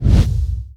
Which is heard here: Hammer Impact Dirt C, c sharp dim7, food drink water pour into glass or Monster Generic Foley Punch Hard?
Monster Generic Foley Punch Hard